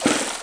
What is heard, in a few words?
watr in, player, male; quake2; coop